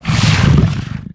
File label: spawn